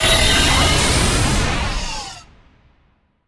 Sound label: Magic